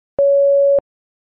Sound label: beep; moh